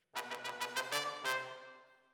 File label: ORTF, Jazz for piano